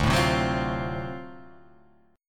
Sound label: D 7th Sharp 9th D; D7#9 strummed; JGuitar